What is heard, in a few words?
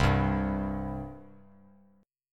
C 5th, C, down; strummed; chord; JGuitar